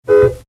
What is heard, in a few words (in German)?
Auto Hupe